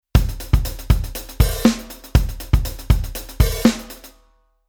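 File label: Rhythm 16beat Hi-Hat Open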